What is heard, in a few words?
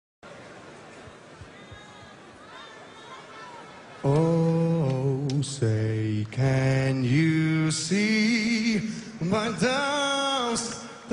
National Anthem Fail; Butterbean Fight